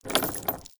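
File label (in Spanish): CADENAS DRAG, chains drag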